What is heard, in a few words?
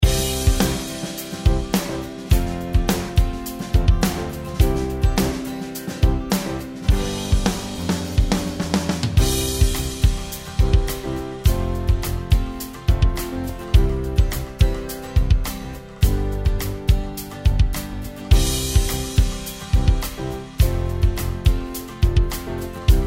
karaoke minus